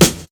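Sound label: snare, drum kits; InVision Interactive; 40 Oz Phat Slammin Hip Hop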